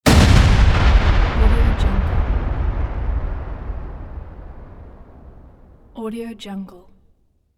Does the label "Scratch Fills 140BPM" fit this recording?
No